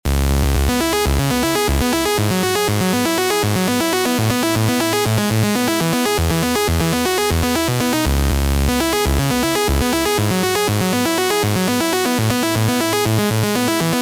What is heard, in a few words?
lead noisy saw dry; soliton; chiral audio